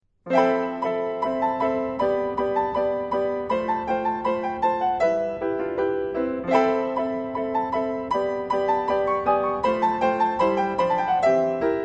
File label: Piano; Quatre dialegs Ritmos